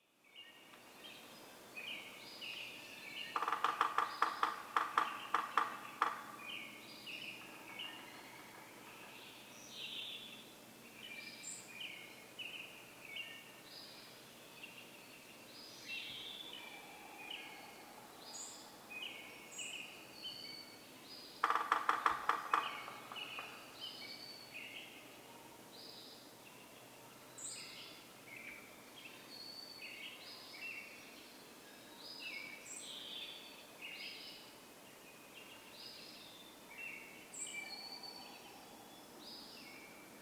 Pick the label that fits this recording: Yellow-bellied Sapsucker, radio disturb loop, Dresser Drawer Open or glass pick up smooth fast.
Yellow-bellied Sapsucker